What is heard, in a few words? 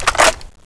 m249 box in; cstrike; DEX